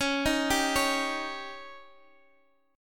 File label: C sharp Suspended 2nd Flat 5th C sharp Guitar Half Step Down